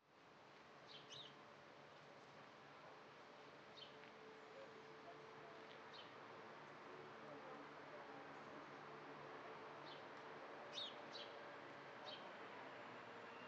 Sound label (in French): Oiseaux Discussion Au Loin Mobylette Au Loin; Petite Ceinture